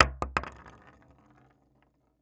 bullet shotgun shell drop roll wood surface

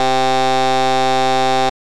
Test Failed

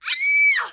Ambient Kill Female

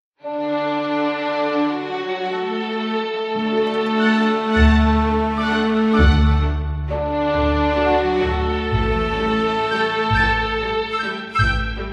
Finishing Move! (Classy Space Laser); Hell Yeah! Wrath of the Dead Rabbit

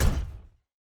Footstep Robot Large

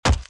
axe body

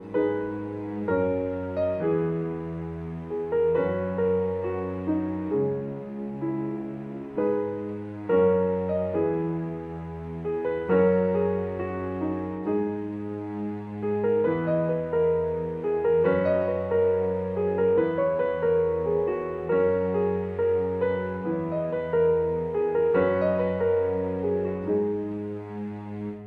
raven loop

